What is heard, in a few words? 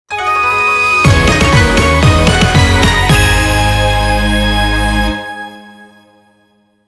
End Jingle; Zakuzaku Shichifukujin; Akane No End Jingle